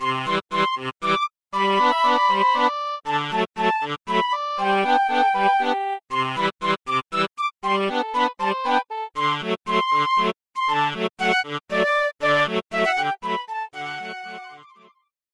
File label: Sea Shanty; Shanty Claws